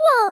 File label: Wah; Miku; SM64 CO-OP; Triple Baka Pack